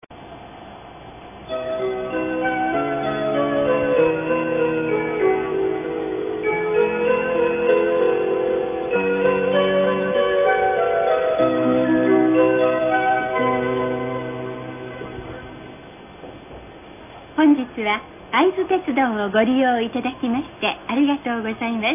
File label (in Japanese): 会津若松発車後 オリジナルチャイム, 車内チャイム 車内放送; 普通列車; （会津若松→会津田島）; 会津田島行き普通。2005年11月; aizutetsudo